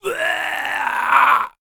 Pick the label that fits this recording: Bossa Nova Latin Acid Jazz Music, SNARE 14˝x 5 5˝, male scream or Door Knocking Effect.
male scream